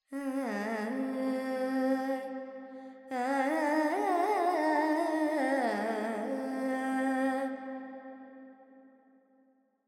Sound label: Carnatic Female Alaps, Purvi Kalyani, 100 G, Verb